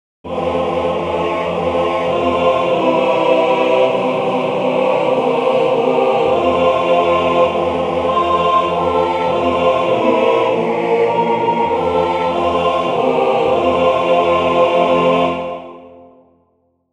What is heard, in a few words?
Happy Birthday adaptive